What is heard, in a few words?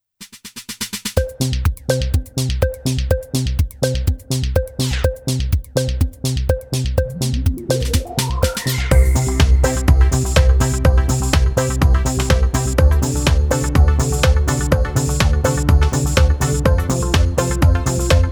Bubble Machine; Noisebiscuits